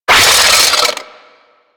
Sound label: Drone Blade Jump; Weapons